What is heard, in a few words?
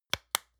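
lotion large lid close medium double, hairdresser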